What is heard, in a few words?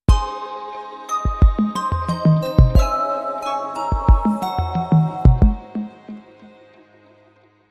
Sting